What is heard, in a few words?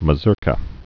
mazurka